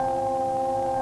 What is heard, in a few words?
Motor, Train; OpenBVE